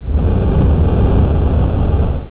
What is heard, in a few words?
deep wind